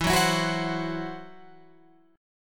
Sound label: E Suspended 2nd Sharp 5th E, Guitar, Open F, Esus2#5 chord; 16,13,16,13,15,13; strummed; JGuitar